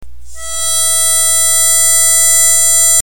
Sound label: harmonica E5